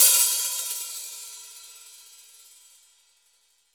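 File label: cymbal, Naturals; Club-50 - Foundations Roland; Natural 11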